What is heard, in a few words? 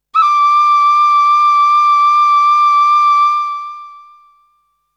Flute; ZERO G - Datafile DISC 3